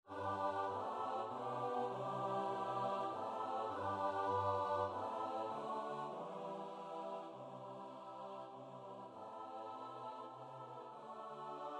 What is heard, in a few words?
midi; nowowiejski; msza polska bogu rodzica credo